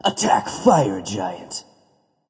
Attack; Iron Crow; Hou Yi; Smite

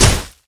projectile impact; Queen of Pain

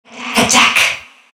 attack; Phantom Assassin

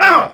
Grunt Hit; Paladins; Owl

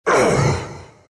dragon pain; Dragon Knight

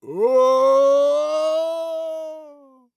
Falling; BombKing; Paladins

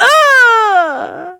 pain; Dizzy

